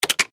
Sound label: Button Switch; Multimedia Web Interactive